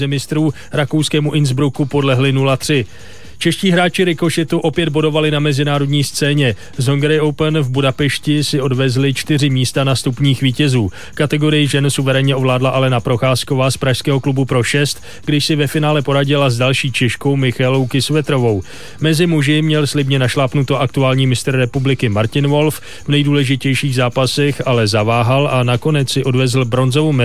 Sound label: beat